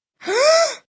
Gasp, Breath, 1 child, sigh, deep, inhale, human voice, kid; Gasping